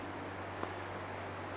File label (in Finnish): tuollainen rastaan aani vai onko se puukiipija